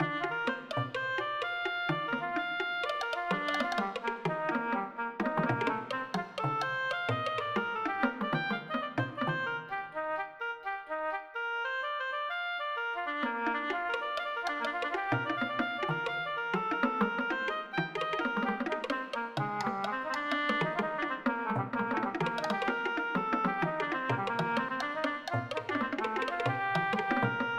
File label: obo wood; vyper